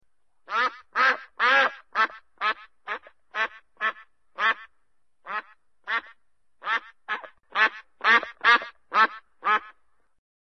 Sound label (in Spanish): Sonido pato; pajaros; sonido de animales